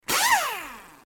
Mechanism Fast Rev, servos

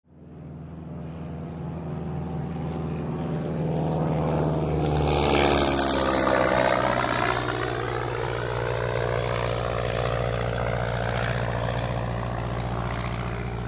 Airport Bg W Propelle

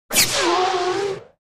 Synth Gun Ricochet Mu, gun ricochet

